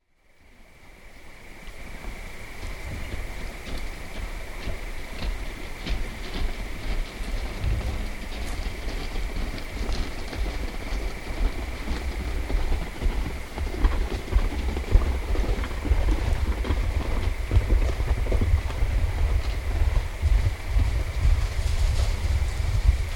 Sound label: mule deer